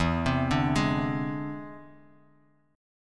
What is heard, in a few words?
E Augmented 7th E down Bass Standard; arpeggiated